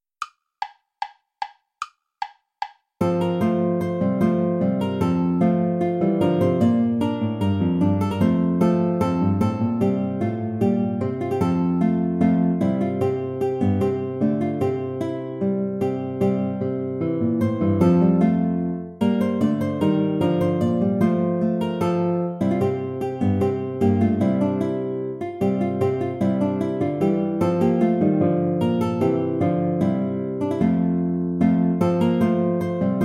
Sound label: bourree play along without guitar; praetorius michael iii